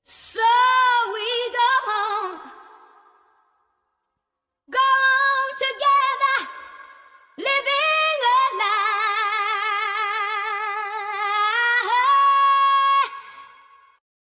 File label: Vocal Fm